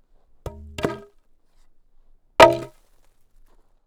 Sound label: Wood Drop Tent Poles Fall; Props; SFX Producer; HauntedBloodlines